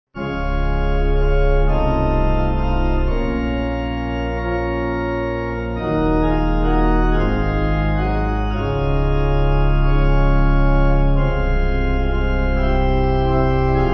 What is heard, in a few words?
Pipe